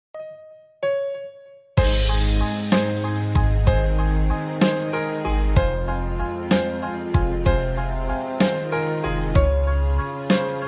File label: instrumental remix ringtone